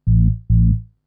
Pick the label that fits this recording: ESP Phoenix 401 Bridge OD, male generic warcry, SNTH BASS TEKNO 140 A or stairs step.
SNTH BASS TEKNO 140 A